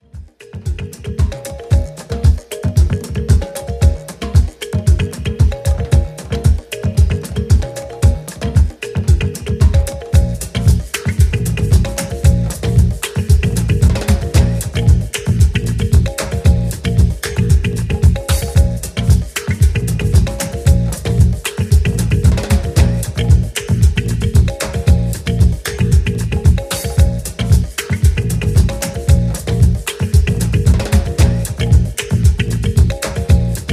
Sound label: Kalakuta Soul Records